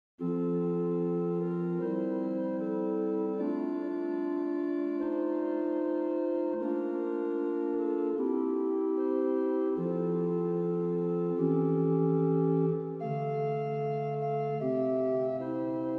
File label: Rotary Fun